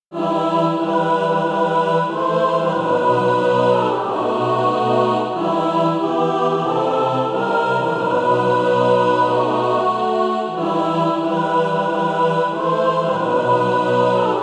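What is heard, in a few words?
mixed, Practise Music; Flee as a Bird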